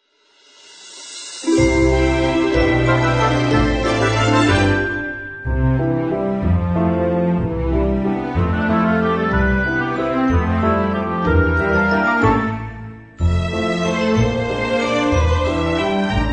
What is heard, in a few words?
Fairy Tale Ident Reduced mix